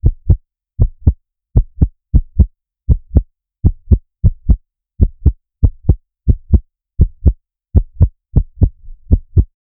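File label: a strong sounding heartbeat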